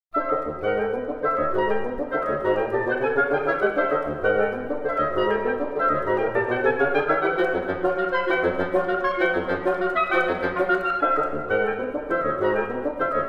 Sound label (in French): Musique pour vents, Finale; Hetu; Quatre miniatures IV